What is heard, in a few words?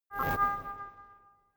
notification message synth buzz; kommunikation; signale